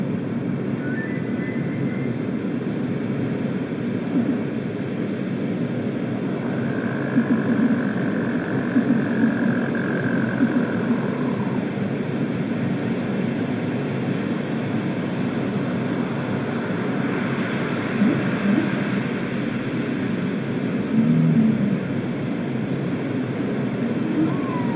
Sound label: Whale Party